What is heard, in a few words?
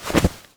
leather get, items; Fate The Cursed King